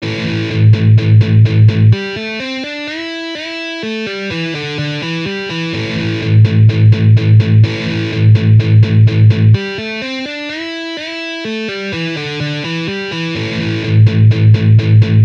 Metal guitar riffs

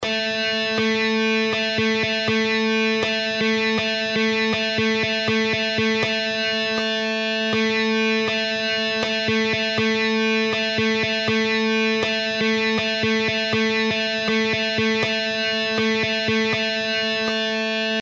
Guitar triplets

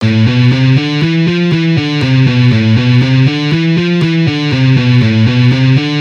Hammer ons, guitar

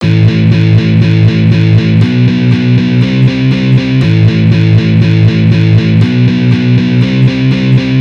Easy Guitar Riffs

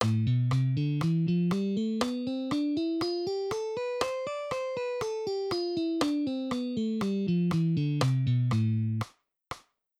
Arpeggios on guitar

